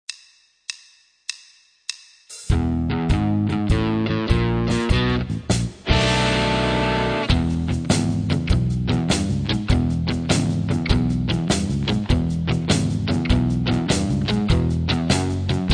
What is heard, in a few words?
Rock Playback, Rock & Blues Solos; De Haske